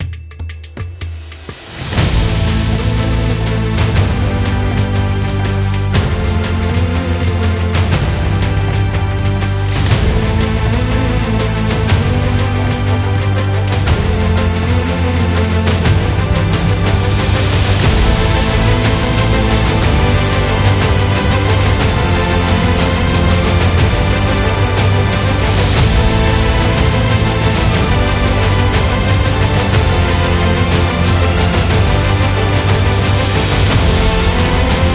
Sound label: Indian Epic, epic cinematic trailer music